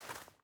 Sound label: footstep burlap snow walk; Rust